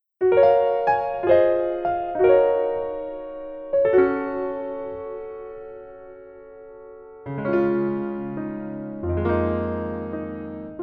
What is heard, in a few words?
Piano